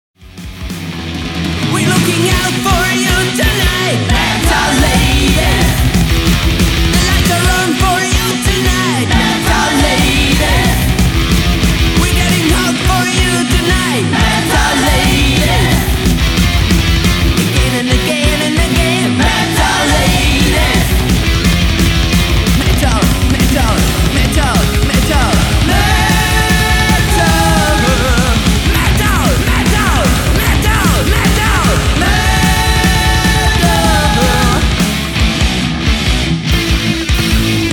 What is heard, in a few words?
Metal Ladies Sample Track